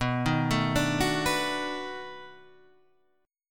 B 7th Sharp 9th, down, Guitar, Open G

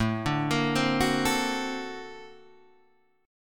Bb Augmented Major 9th A

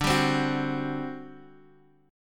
G Major 7th Suspended 4th, D

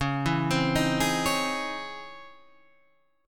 Db Minor Major 9th Db; arpeggiated; DbmM9; JGuitar

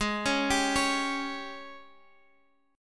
Ab Major 7th Suspended 4th Ab Guitar Standard; arpeggiated; x,x,6,6,8,9; AbM7sus4; JGuitar

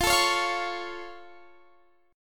C sharp Augmented

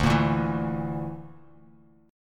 D Suspended 2nd Sharp 5th D, Bass Standard; Dsus2#5; strummed; JGuitar

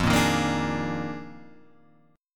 D sharp Major 7th Suspended 2nd Suspended 4th F

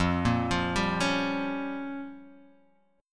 A sharp Minor 6th

